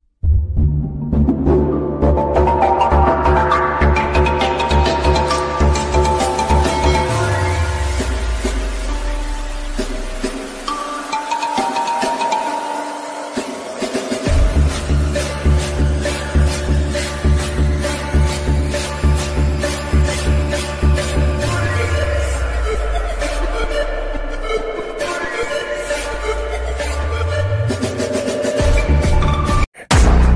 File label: devil ringtone as best beat